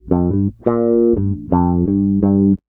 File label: FUNKY 86 C, Bass Grooves; USB Soundscan vol.30; AKAI